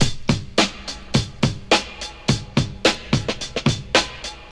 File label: 107 bpm drum loop e key